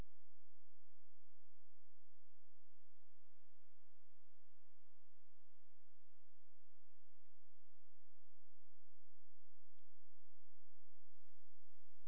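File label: hydrophone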